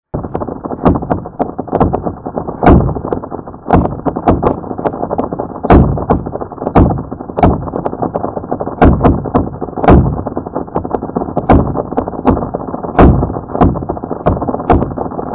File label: Drumbeats